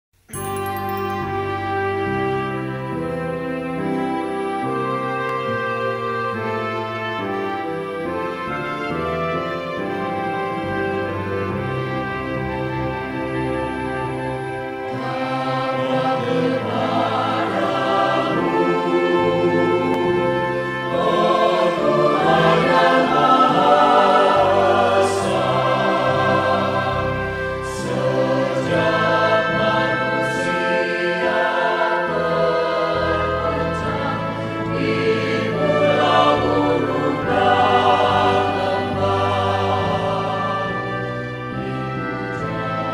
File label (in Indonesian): HYMNE PERHUBUNGAN